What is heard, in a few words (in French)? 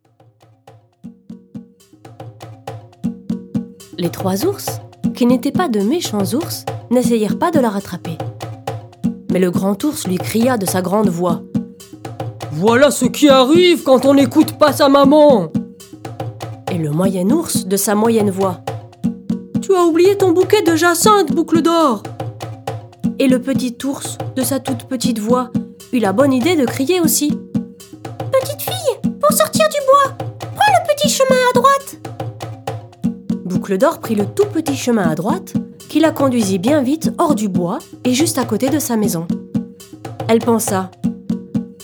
boucle dor percus